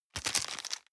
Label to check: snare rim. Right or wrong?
Wrong